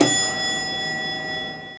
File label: Lift Bel